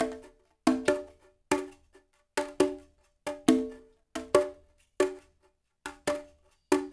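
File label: BRUSH CONGA; Spectrasonics; Liquid Grooves 1 & 2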